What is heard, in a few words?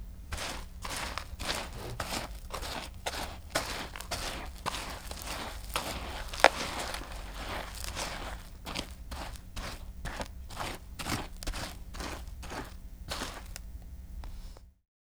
Box of Dirt